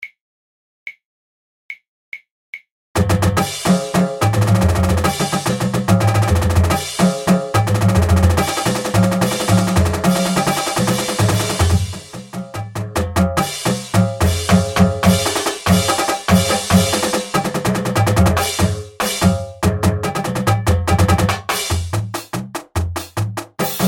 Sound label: drums only; Firebird